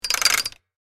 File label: Safe vault dial lock turning click; gears